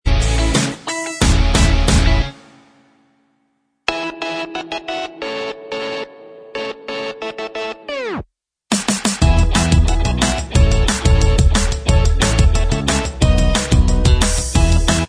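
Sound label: instrumental, backing track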